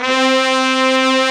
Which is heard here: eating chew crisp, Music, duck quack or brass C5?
brass C5